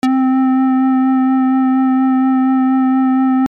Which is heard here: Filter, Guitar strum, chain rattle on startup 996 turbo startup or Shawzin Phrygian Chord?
Filter